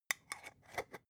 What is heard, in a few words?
spice jar scratch tonal close soft; industrie wirtschaft; gastronomie